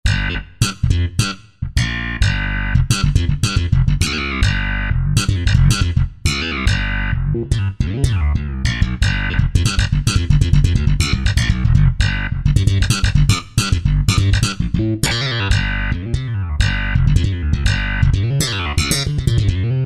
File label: Mono Slap Bass, Korg TR-Rack